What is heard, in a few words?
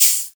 Slider out; Apache; Slide Sounds